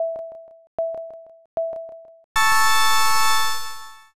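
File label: countdown new year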